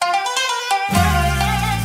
desert hills riff

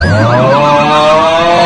snore aaaaaaaa

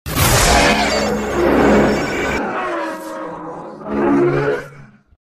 spindash; sonic x

